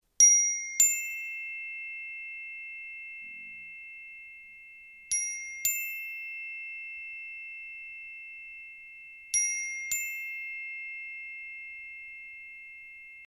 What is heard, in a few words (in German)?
klangstab einfach